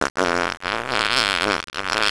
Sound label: melody